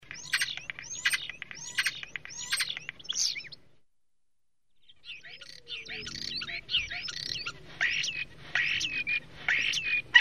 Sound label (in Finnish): KOTTARAINEN, Linnut; OMAT AANITYKSET